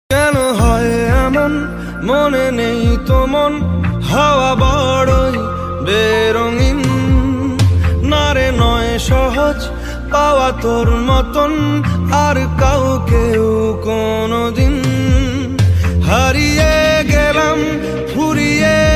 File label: Bengali Ringtone